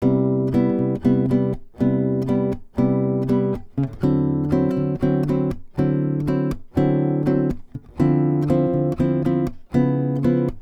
Salsa Picante; Masterbits; World Zone VOL-3

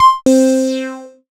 Yamaha CS40M Leads and Keys

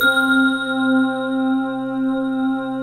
LINEAR SYNTH

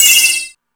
FX Sounds, Drum Machines

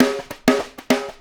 Snare Pattern, Drums; Essential Percussion

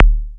bassdrums, techno; single-sounds; single-drums

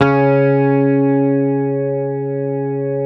Hamer 12, SUS, C#3, Bass; Sampleheads; Will Lee Bass Library

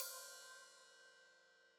Hat Open, Hi-Hat; Deeply Sampled Drums; Cymbals Stereo

